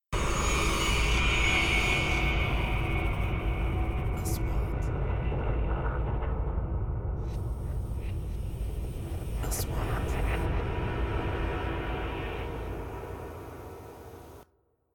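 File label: 3D SFX Trailer; مؤثرات تشويقية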